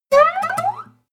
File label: string chirp slide up triple